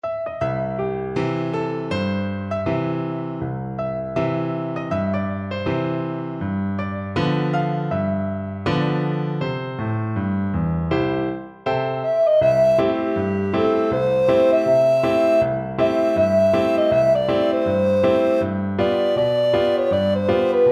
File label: down by the riverside, alto recorder